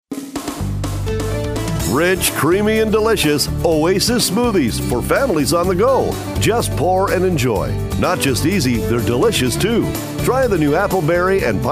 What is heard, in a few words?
Smoothies Full Mix